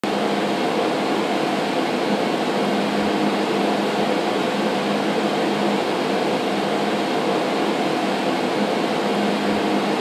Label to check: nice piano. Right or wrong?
Wrong